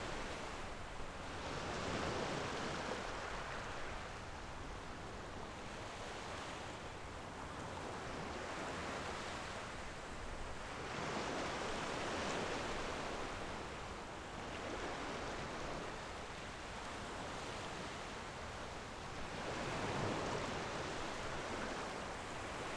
amb ocean beach; chicory a colorful tale; ps4

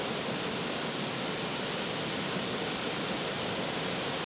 weather rain loop; adams venture origins

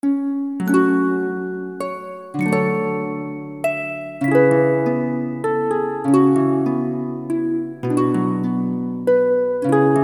Waltz harp version; Venus Braves Majo to Megami to Horobi no Yogen; PS2; PSP

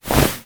run foley start

